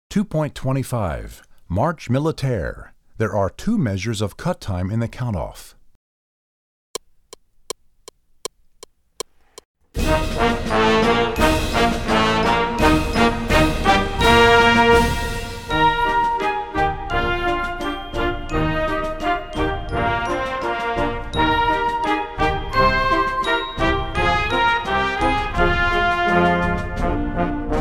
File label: Marche Militaire